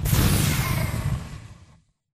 boost jet body; Titanfall 2

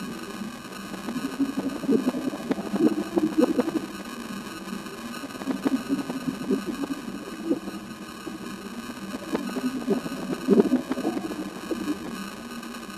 digital distortion; Titanfall 2

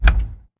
bt footstep jog parts; Titanfall 2

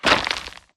Mvmt FS Jog Dirt Layer; AI Stalker; Titanfall 2

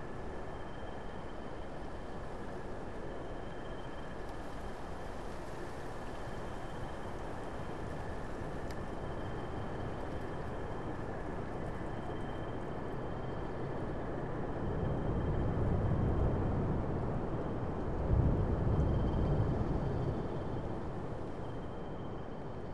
amb int half open; colony; Titanfall 2

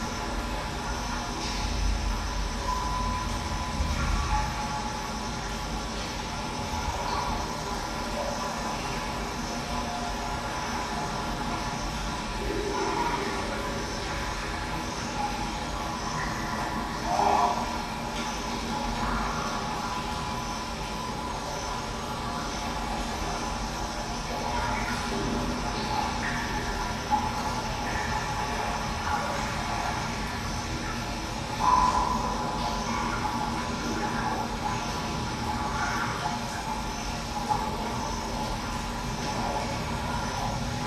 AMB INT Small Cave; Boomtown; Titanfall 2

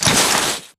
bar fight body slide; Titanfall 2

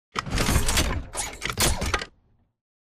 alert idle to walk; Titanfall 2

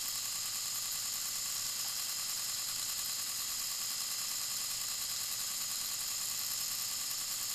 server room data loop; Titanfall 2

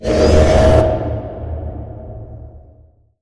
pod door lg verb open slide; Titanfall 2

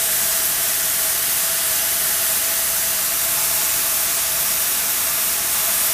steam medium; boneyard; Titanfall 2

